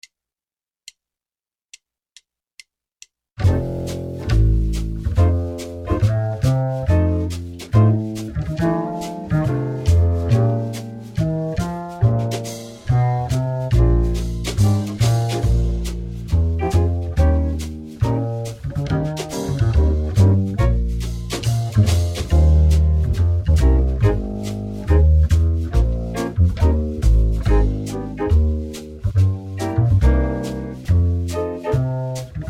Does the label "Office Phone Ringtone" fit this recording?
No